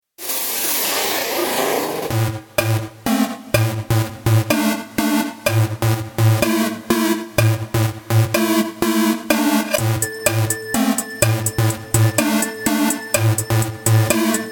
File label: Elektronika Deep House Song; SampleTank 3 CS